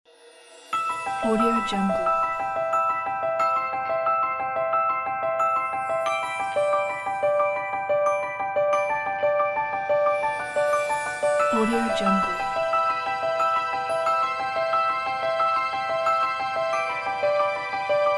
christmas magic

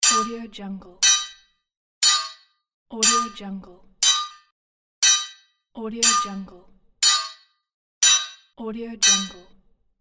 hammering an anvil loop

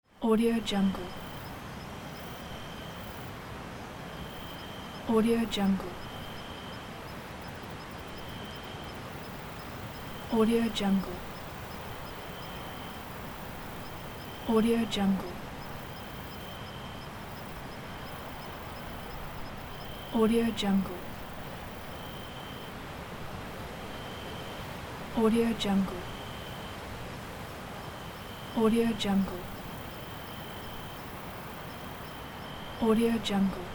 forest ambience in a windy night; ed soundmaker

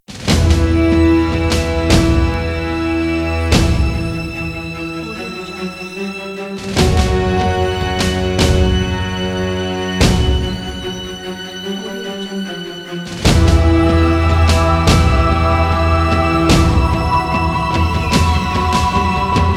epic heroic trailer ident

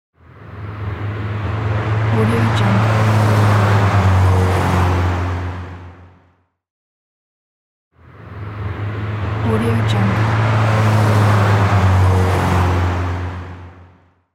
several car pass by

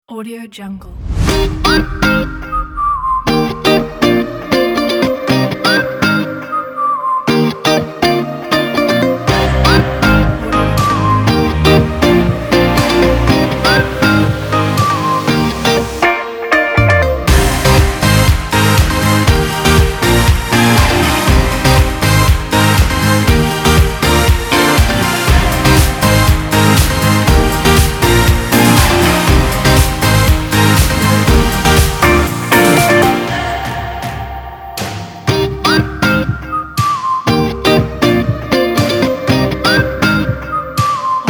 happy; top-flow

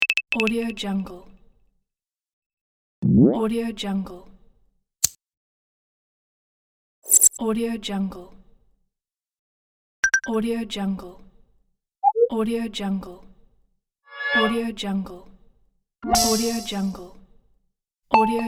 interface alert sounds pack; capeaudio

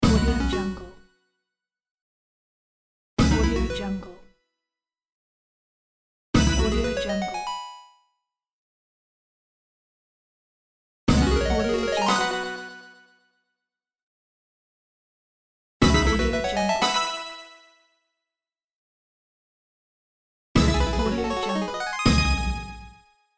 casino slot machine bonus and jackpot

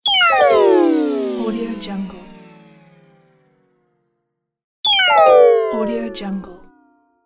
time up fail

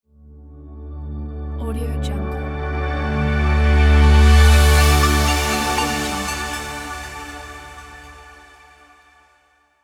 branding logo

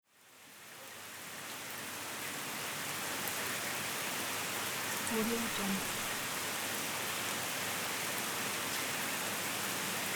rain

